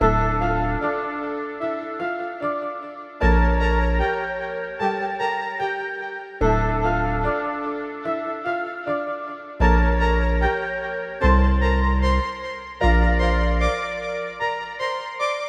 Groovr Loop, 150bpm; jenny leclue detectiv

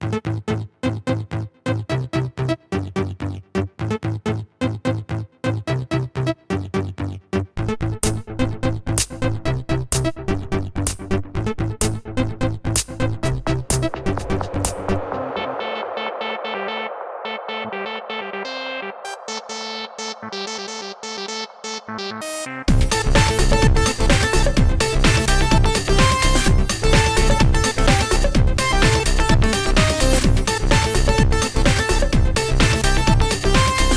laserdance; Avoid Sensory Overload

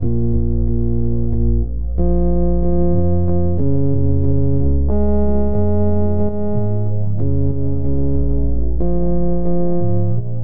loop

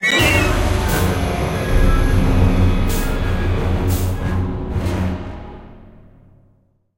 Terror Sting; Jurassic Park The Game

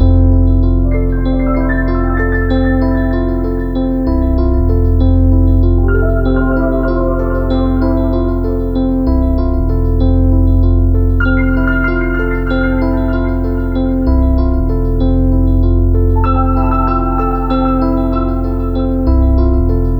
Ambient Loop; Lost Ruins

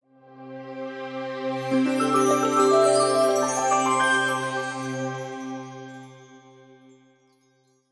Sparkle Water Released; Super Mario Odyssey

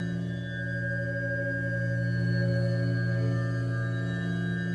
Well Loop; Chronovolt

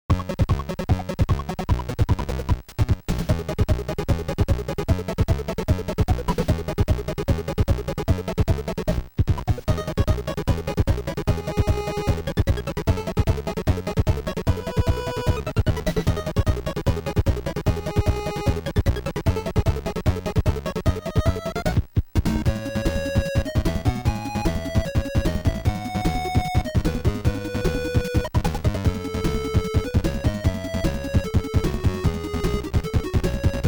Castle Level; Kirby's Adventure

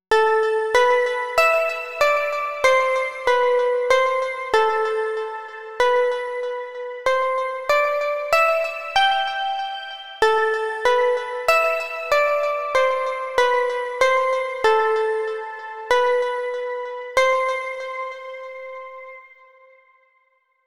Harp Melody

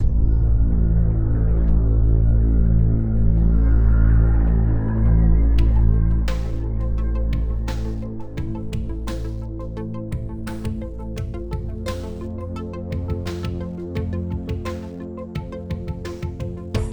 Menu

